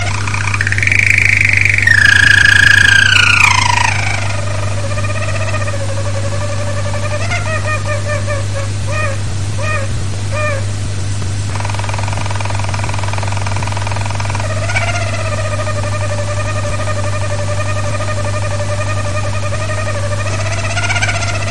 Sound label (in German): vogel, harzer roller